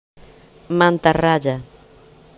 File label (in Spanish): mantarraya